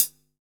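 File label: Stand Hat closed, Fusion Kit; Akai MPC500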